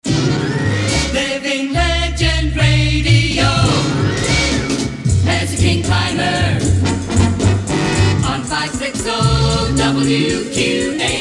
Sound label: Living Legend Climber with Pad; WQAM; Pepper-Tanner